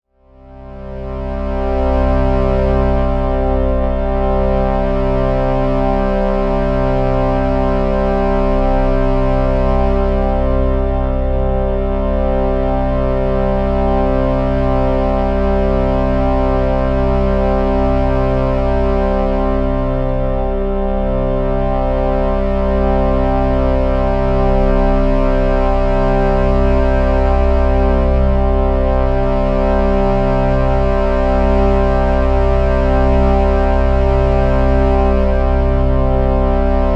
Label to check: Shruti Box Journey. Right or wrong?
Right